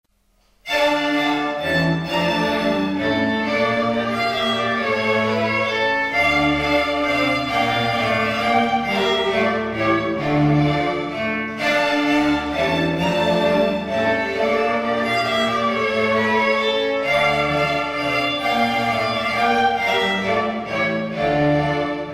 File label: String Ensemble; Trasimeno; Handel